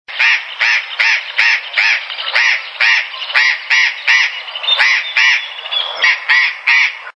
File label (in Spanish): voz loro barranquero